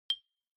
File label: Xylophone, Single